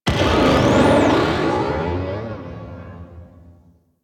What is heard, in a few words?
Rail Jack Fighter Initial Death Explosion; Enemy Ship; Corpus